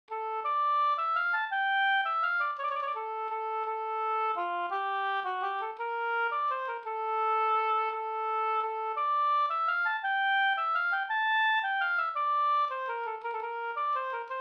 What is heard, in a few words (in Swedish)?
vals Brudvals; av Olle Moraeus